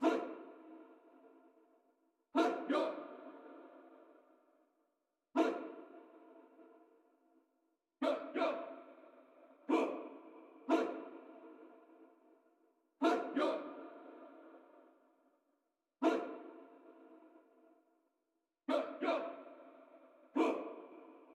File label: music interactive; World of Tanks